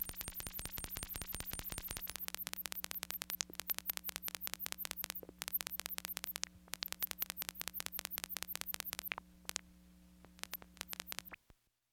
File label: improvisation from dat; post box media